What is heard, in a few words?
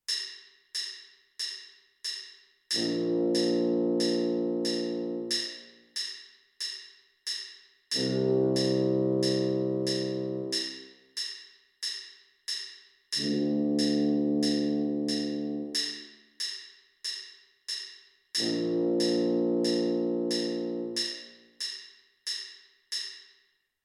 tuba